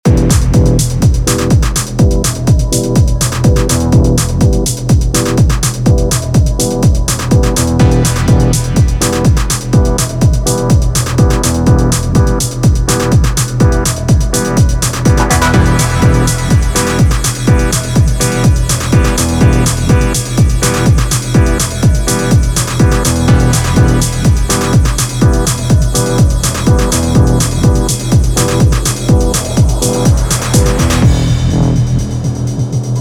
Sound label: techno; abyss